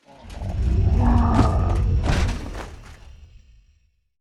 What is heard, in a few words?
dark archer death, monster